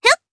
Jump, jp; Cecilia; kingsraid

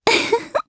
Laugh, jp; Yuria; kingsraid

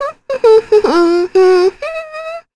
vox Hum; Mirianne; kings raid; en

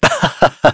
Human Happy; en; Shakmeh; kingsraid

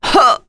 Vox Damage; Priscilla; kingsraid

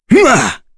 Vox Attack; Kain; kingsraid; voices heroes en

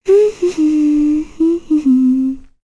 Hum; kingsraid; voices heroes en

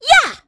Vox Attack; Estelle; kingsraid; voices heroes

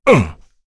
Vox Damage; kingsraid; voices heroes; en

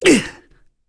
Damage, Vox; en; Baudouin; kingsraid

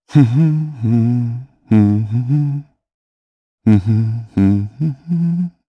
Human Hum; heroes; Shakmeh; kingsraid

